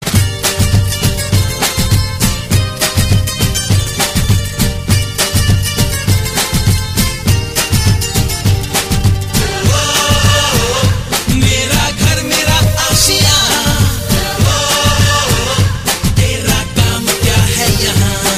telugu; kushi

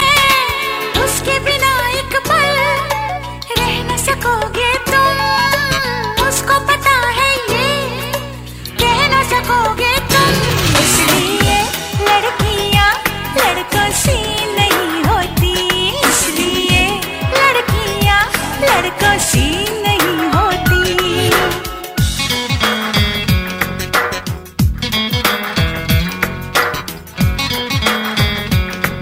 Lady Voice Hum; Hum Tum

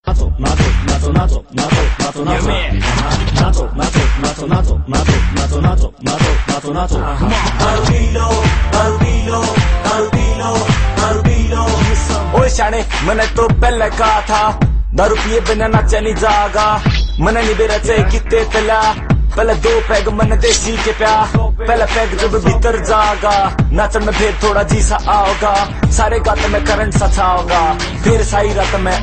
Haryanvi

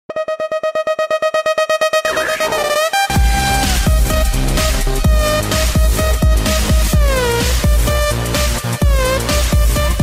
Electrohouse

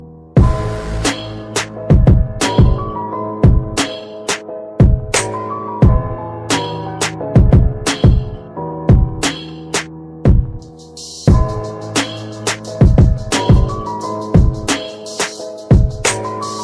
Trap vs sad

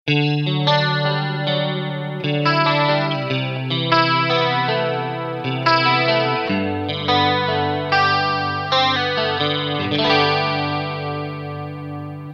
Guitar Pluck

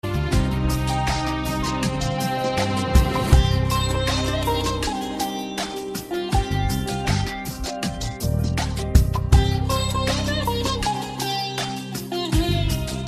Malalayalm Classic

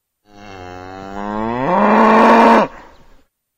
Angry Cow

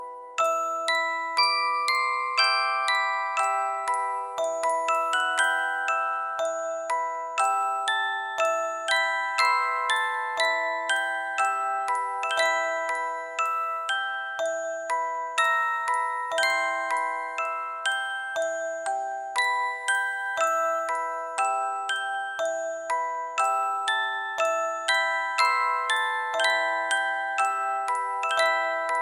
Music Box

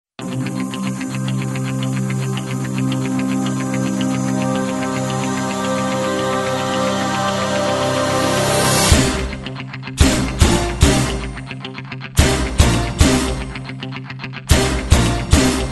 Drum Beat

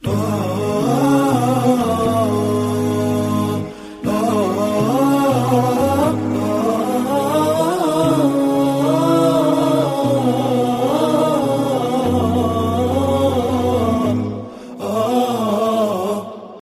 islamic

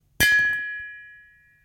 Can Hit

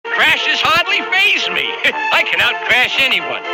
Launchpad crashes; meloboom

